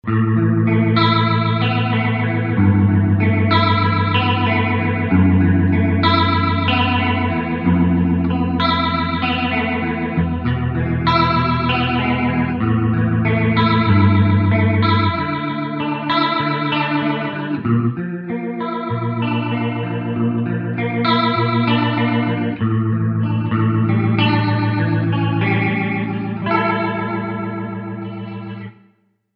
Chorus Tremolo

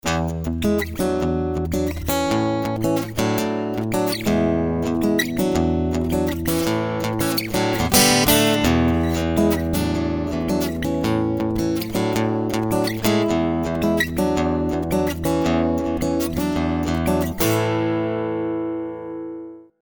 Rhythm ´n Blues

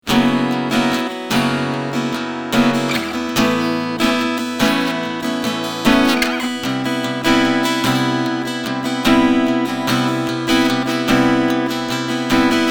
Strumming Boost PRSC Bass und Treble

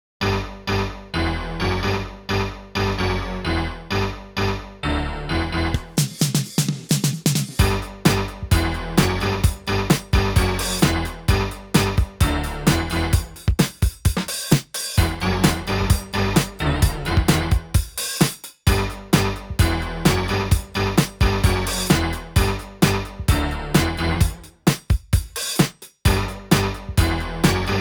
Yamaha TX16w Metalkey Beat

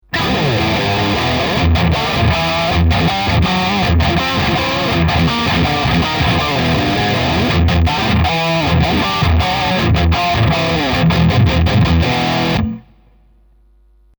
Heavy

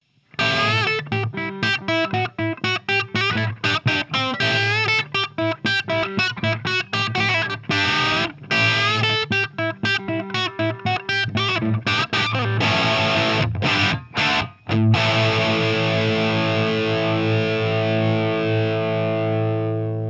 Light Crunch Whisky Guitar

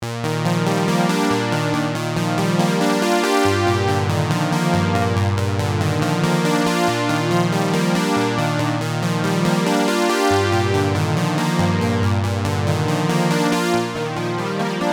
CE1 Chorus

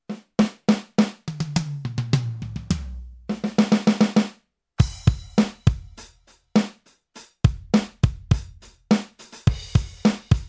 Millenium MPS 750 Studio Kit

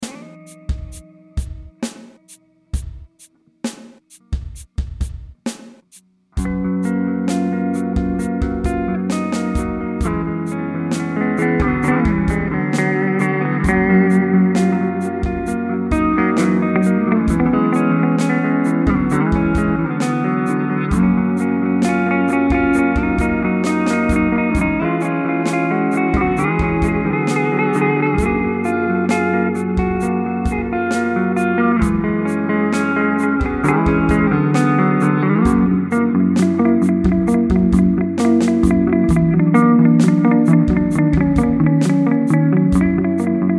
Mooer GE 150 Powerbell ambient

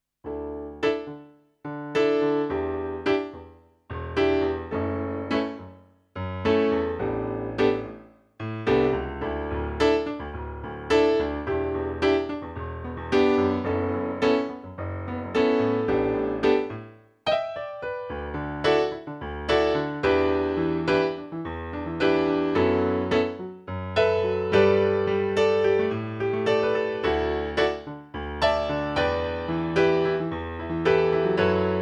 HP504 Concert Grand

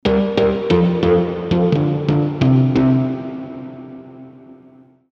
Crushed Pluck; Fabric XL

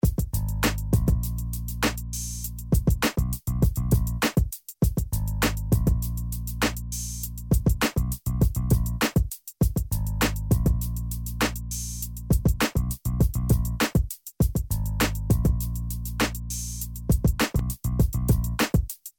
Loop Orig

